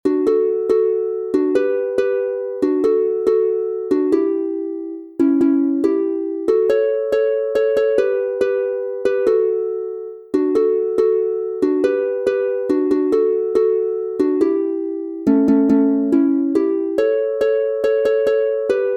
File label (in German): D-Dur 2 st instr, Harfe; Ja da muss oina hi´ werdn